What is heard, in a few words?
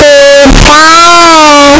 pikachu cry; pokeyellow